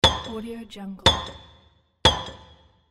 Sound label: blacksmith